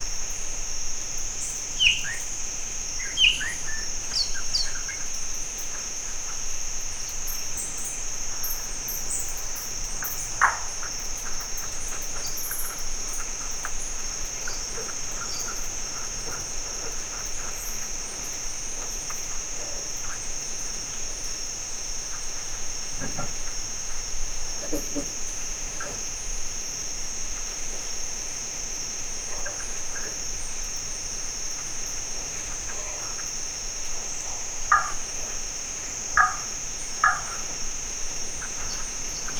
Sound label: ethiopia nature; guji; low-volume